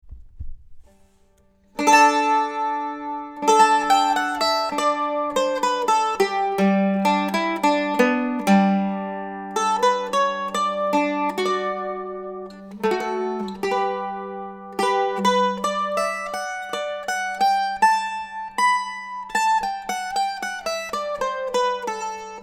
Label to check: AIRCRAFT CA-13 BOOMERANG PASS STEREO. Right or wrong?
Wrong